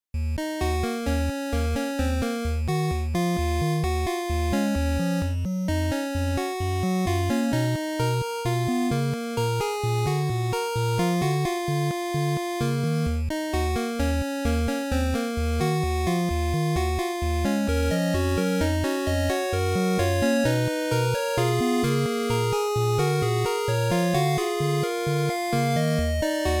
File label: 8bit chip nostalgia